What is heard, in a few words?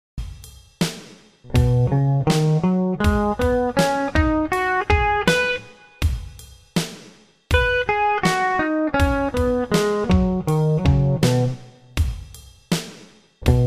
b maj pent